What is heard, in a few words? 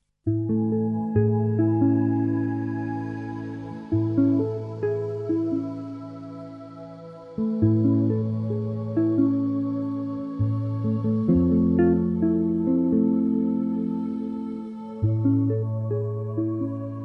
Celestrial Calm